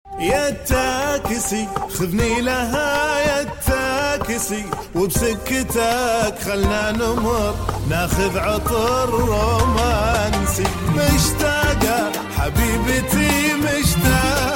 arabic song

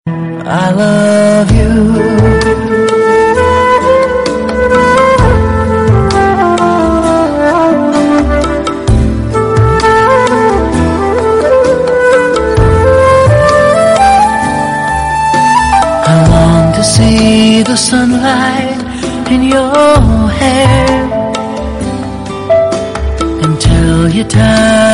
sad ringtone

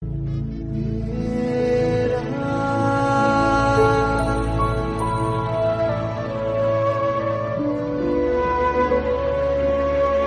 sad ringtone

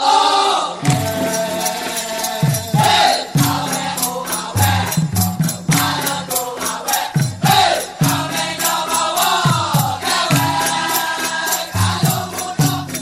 dikir barat

